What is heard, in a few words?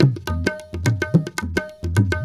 India, Heart of Asia